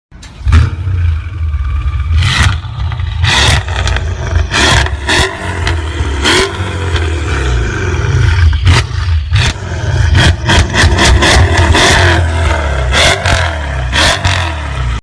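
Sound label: Maybach, exhaust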